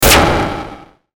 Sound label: Hit Static Descend Laser, Descend Static Power Down; Production Element Imaging Element Accent Transition; Blastwave